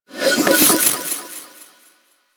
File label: Bark; Warframe; Teralyst; Vomvalyst